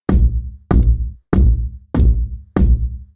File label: Solid state bass drum